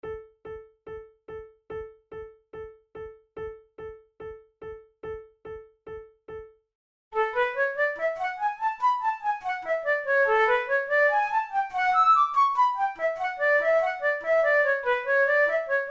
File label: flute